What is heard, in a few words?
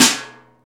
stick, Trash Tuned; Roland L-CD701; Trash+Kitch